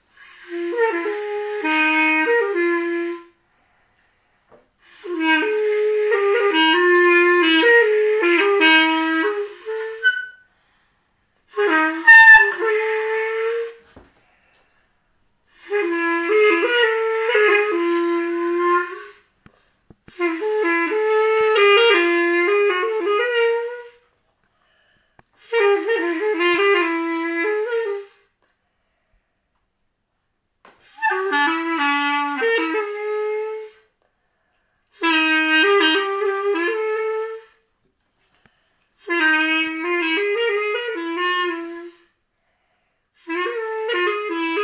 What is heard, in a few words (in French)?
claribole